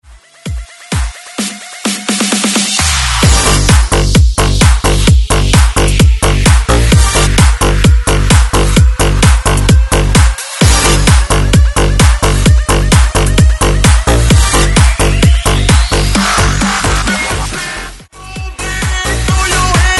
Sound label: Bounce 130Bpm